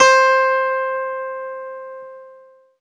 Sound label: ZITHER, Alpine Volksmusik